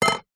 metal pole small set down table; foley

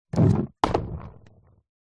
sport skateboard plastic ollie; zapsplat

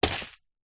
money pouch fabric coins down on surface; foley

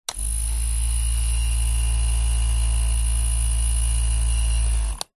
household back massager electronic on vibrate off; zapsplat

